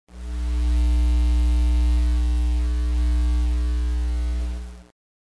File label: machine